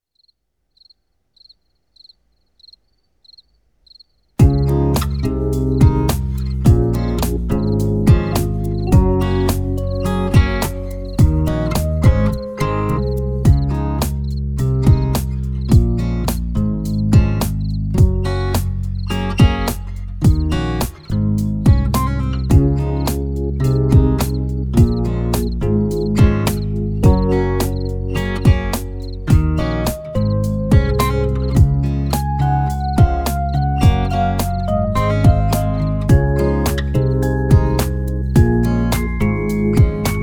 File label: spring rain music